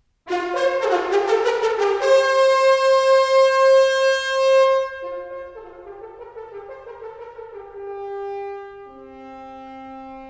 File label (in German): Orchestervorspiel; Solti; Wagner; Gotterdammerung; Decca